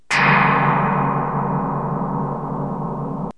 TIMER

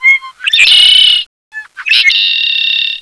Blackbird; Mountain Quest™

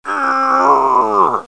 Grunt

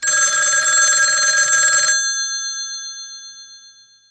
ring

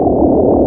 bomber; INCOMING

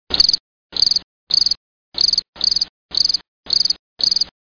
bug sound; How Many Bugs in a Box

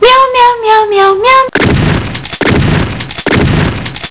Dead cat

